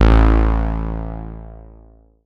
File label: dance, bass; Ueberschall; Techno Trance Essentials